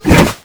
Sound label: Snowy Puggy Damage